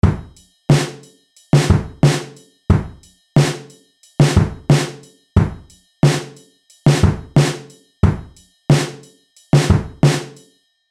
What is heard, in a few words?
6 beat